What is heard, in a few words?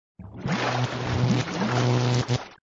Sound Eternal life; Eternal life (ball saved); flipper